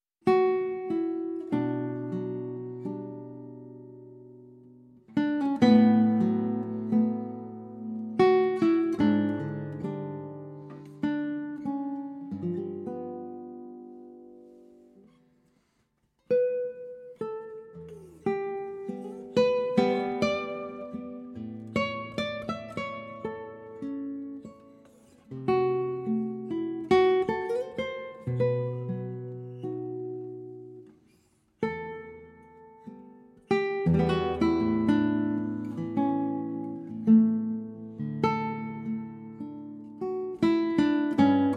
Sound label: Arr. for Guitar; V. Coves